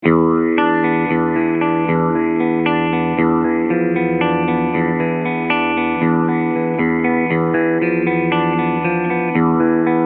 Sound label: Keeley Neutrino Envelope Filter Lowpass LO Cleansound